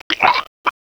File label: drown; Garry's Mod; tf2